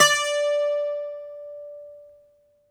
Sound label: Greek Bazouki, Plucked Strings